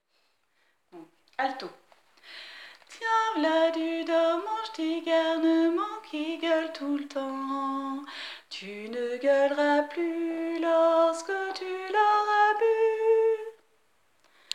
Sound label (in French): Dormant alto Refrain